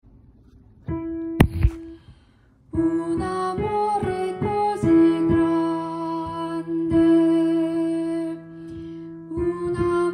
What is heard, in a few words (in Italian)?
un amore altos